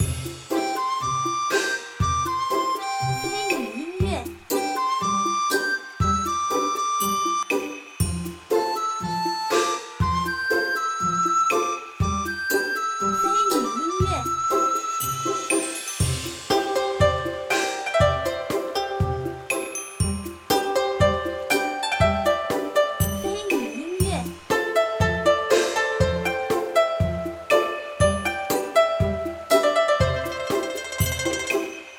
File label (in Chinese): 轻灵-休闲-愉快